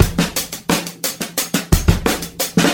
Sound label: no kicks, Boombap Drum loops